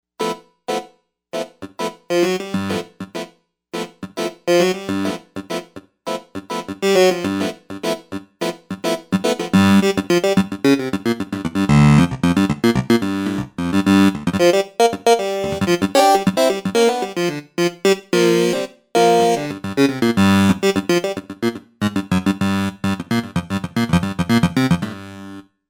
Duble Reed Clav, Novation UltraNova; Factory Patches

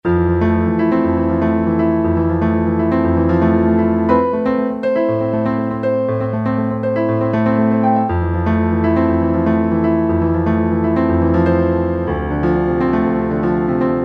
Piano Arp; Yamaha YPT-400; Voices

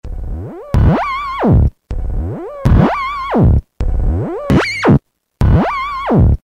Spooky; Roland M-DC1

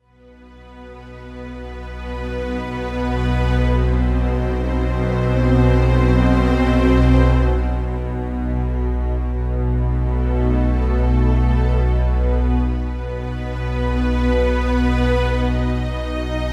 Slow Strings, Roland JV-880; Factory Patches

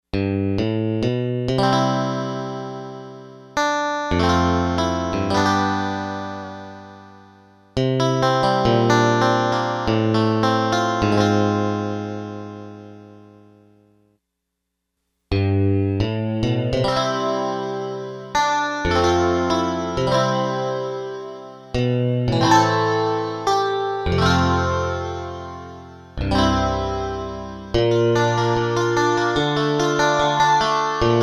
Wide Chorus, Lexicon MPX 1; Factory Programs

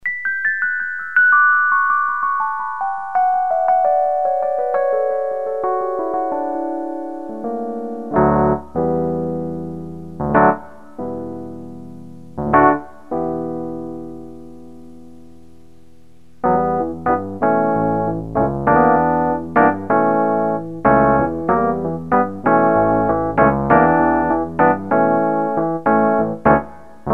El. Piano; Generalmusic pRP8; Preset demos

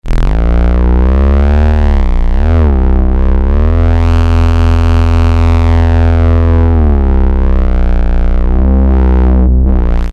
Free Bass

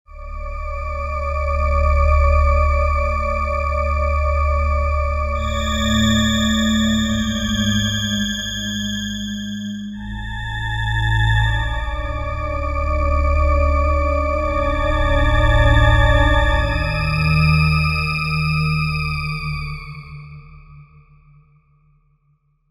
suspense; Universal Sound Bank; Soundscan 14 Twisted Special FX

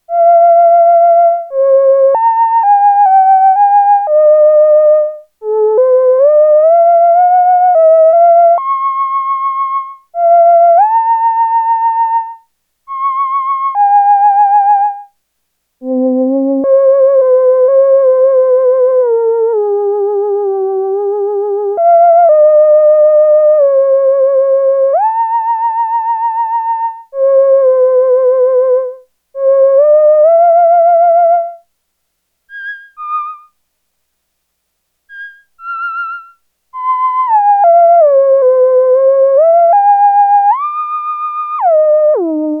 SYNTHE VOICE, Roland SH-101; Factory Patches

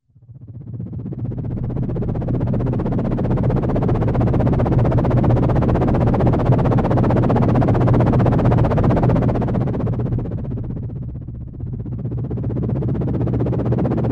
Chopper

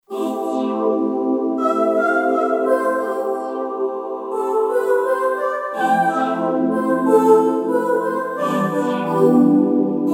Choir Fixer, Kurzweil K2500X; Factory Programs

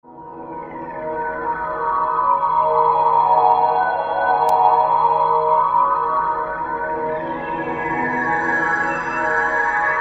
Outer Space

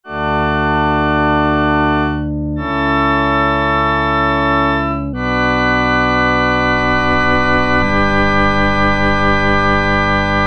Church Organ; Sequential Circuits Prophet-5 (Ver.3); Factory Patch; File 3 Programs

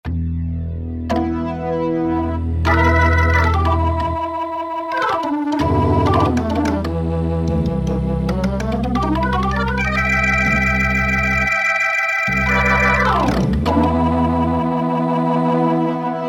B3 X Wheel

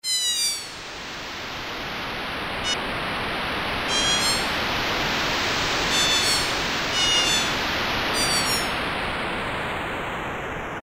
Seagulls

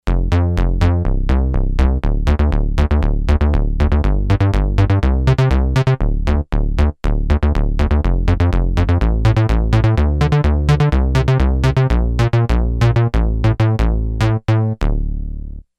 Synth Bass

